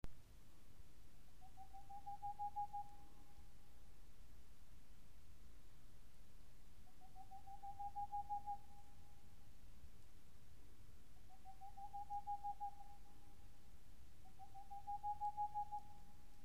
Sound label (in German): raufusskauz